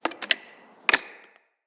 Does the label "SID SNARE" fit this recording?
No